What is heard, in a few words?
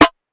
Snare - G; Drum One Shots; Snares; Cymatics; Eternity Sample Pack